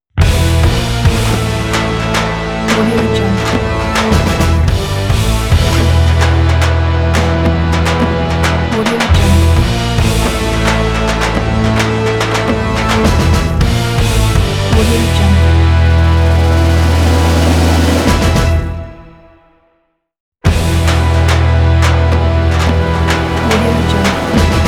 western on steroids ident; shorties

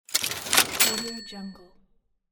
cash register

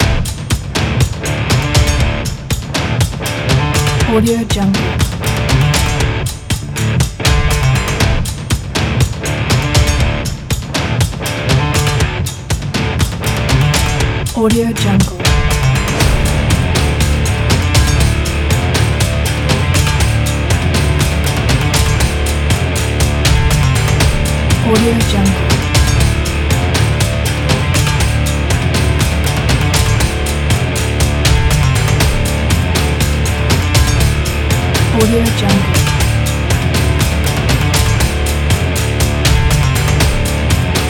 work rock

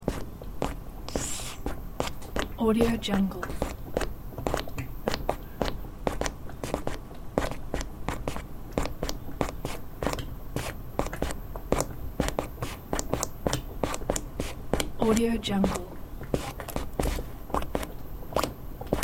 bubbling liquid mud

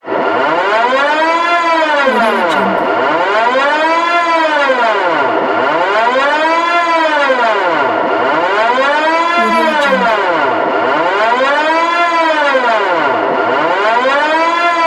catastrophe alarm

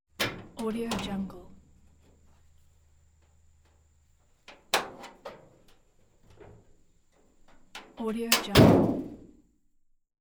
opening closing old pickup truck hood

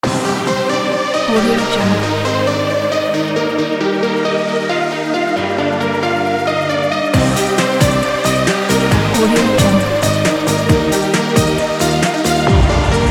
rising logo

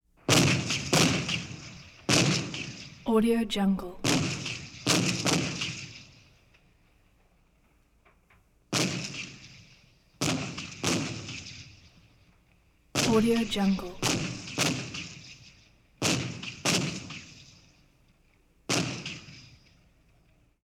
tank fires gun rapid fires shell whistles away

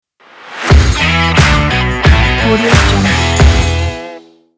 a stylish rock logo

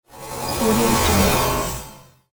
robotic whoosh

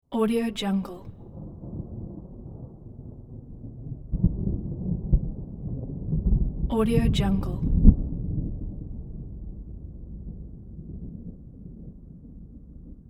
thunder rumbling

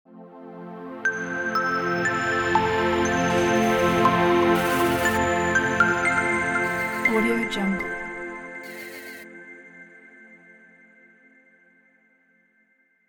digital logo

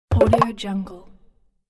percussive score notification

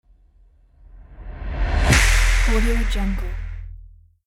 flyby

